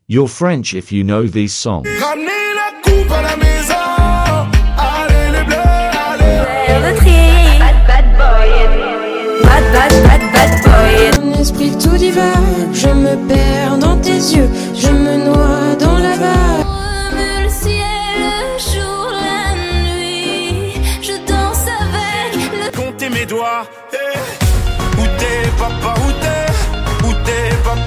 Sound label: french music